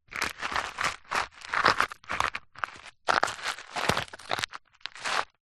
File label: footsteps movements scuffs gentle shoes gravel; foley